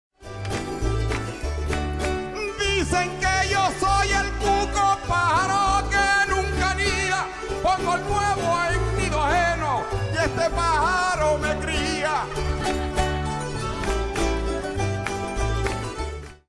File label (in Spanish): Polka, Folclore